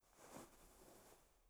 布ズレ

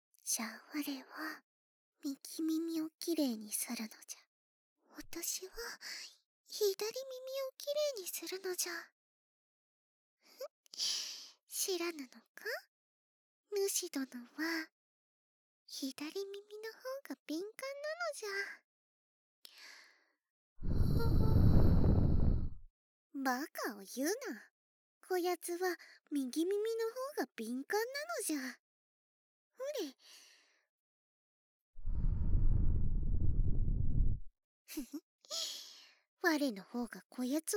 両耳かき; きいろ&ピンクお狐様

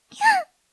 きゃあ！; 帰れないの 本編ボイス

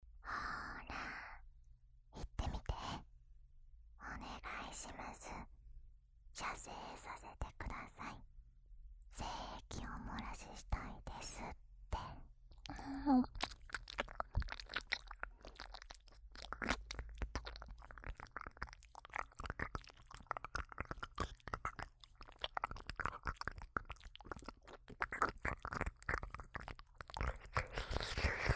手コキ・耳舐め ノイズ除去済; 童貞先生とエッチなおしえごJK

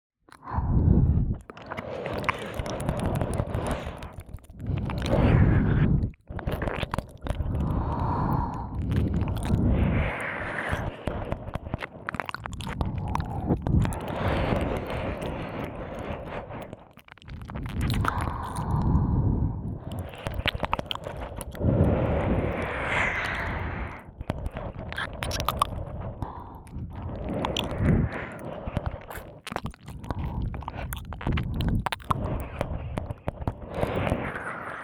吸い込み耳舐め 両耳; 耳舐めコレクション

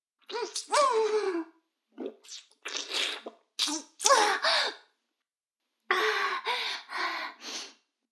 フェラ音声; 逆時間停止されて

